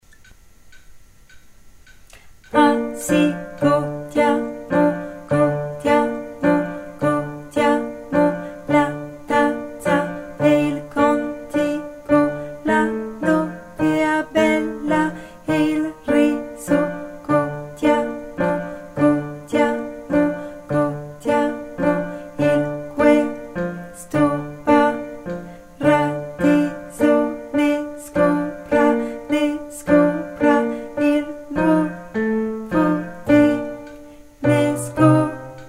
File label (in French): alto